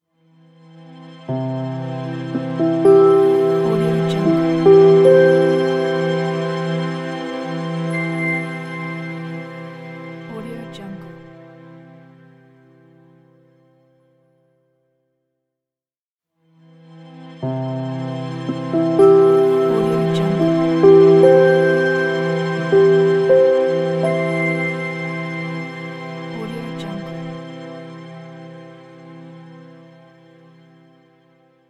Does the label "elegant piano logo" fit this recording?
Yes